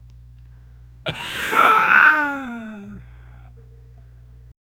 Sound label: a man grimaces in pain